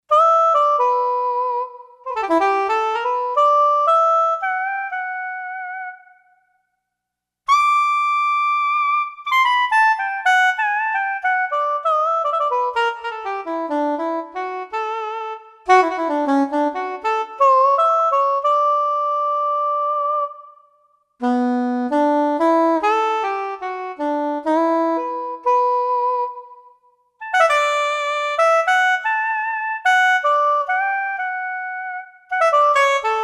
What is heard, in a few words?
Soprano Sax; Roland SC-880; Factory Patches